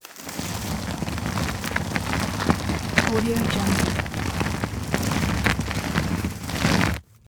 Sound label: body drag on farm lane